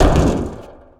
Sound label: land metal vent, footsteps